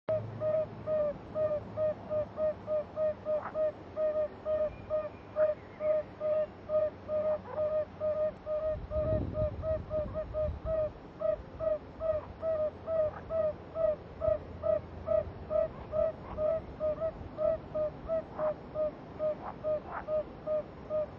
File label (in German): rufe gelbbauchunke